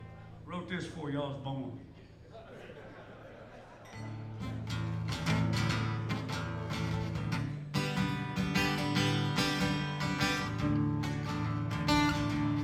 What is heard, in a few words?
Thunderbird Drums